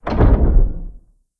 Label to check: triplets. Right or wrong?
Wrong